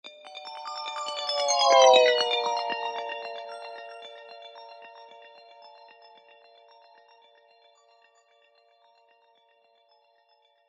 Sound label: doppler shifted ringtones ios